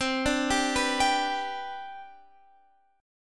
C Major 7th Suspended 2nd, C, down, Guitar, Standard; arpeggiated; capo=2